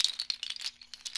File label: Fast Pod Loop